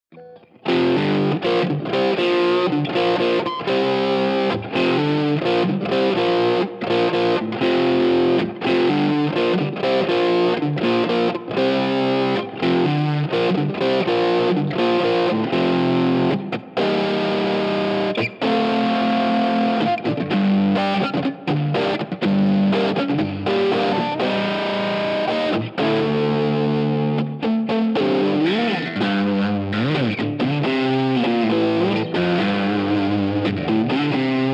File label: Distorted Neck Middle - Marshall Plexi and Tube Drive, pickups; three Fedora pickups (6K each)